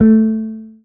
marimba guitar; ゆめ2っき

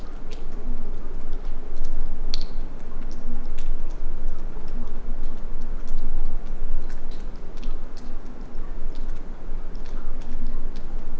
rainyday; ゆめ2っき

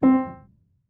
marimba piano

